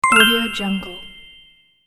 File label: jewel bonus